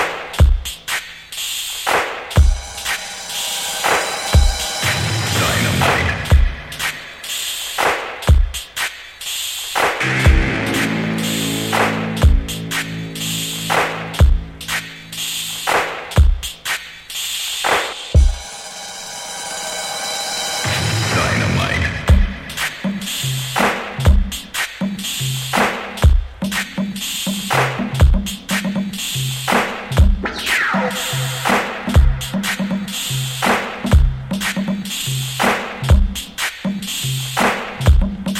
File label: (Drums)